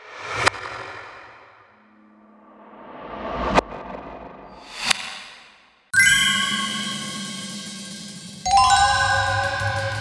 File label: Perc Transitions